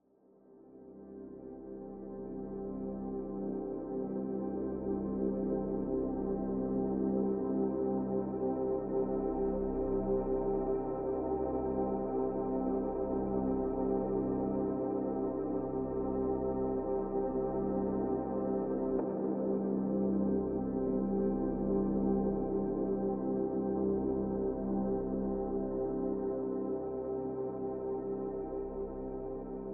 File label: bg loop; thetascape; alpha